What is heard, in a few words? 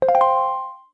Text Close; Close a dialogue box; The Wind Waker; Zelda